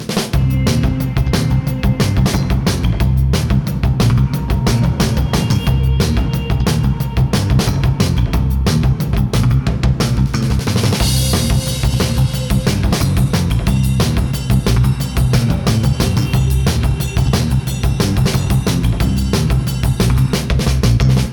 intro loop

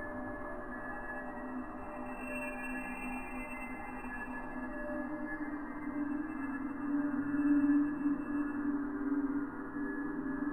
ambiance; DS school project game

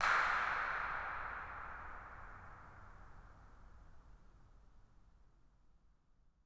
incinerator dirac

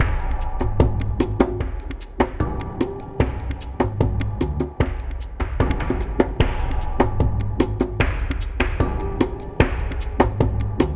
combat

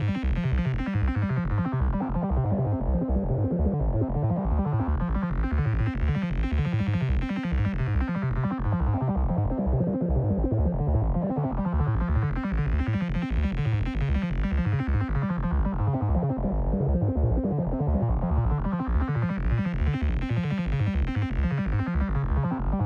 bad flux arps; freeflight

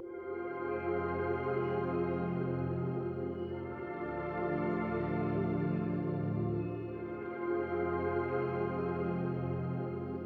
flux arps test loop; freeflight

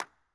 incinerator dirac

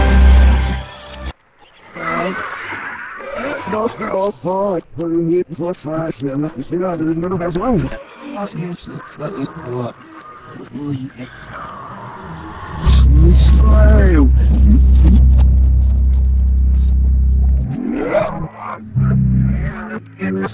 reversed continuation

